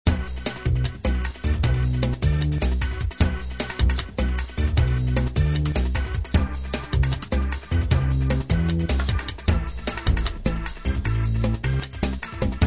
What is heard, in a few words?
rythm